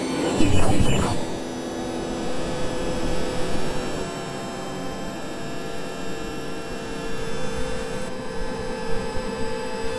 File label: digital pixels clear wit